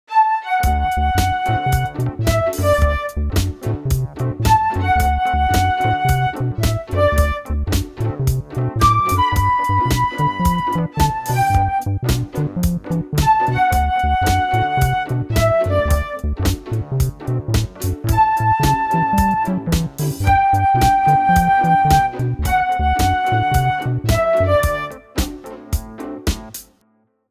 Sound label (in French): tons tons majeur